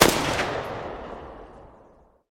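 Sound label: weapon SSG 08 sounds; iz igry cs go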